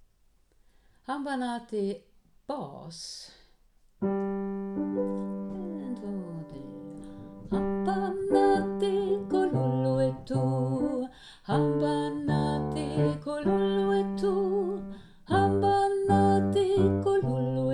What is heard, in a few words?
hamba nati bas; alla kan sjunga koren vi sjunger i höst